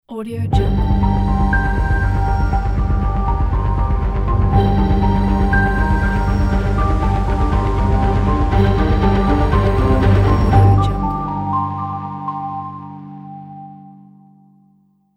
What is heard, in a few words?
space opening hybrid logo